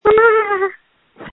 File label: Scream